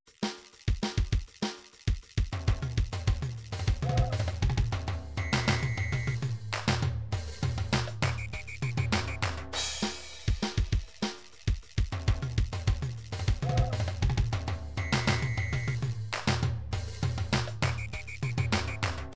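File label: drums pattern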